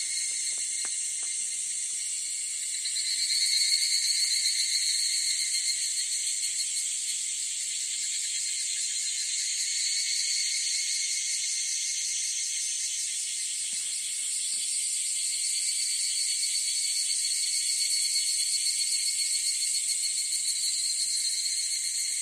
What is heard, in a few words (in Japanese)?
semi shigure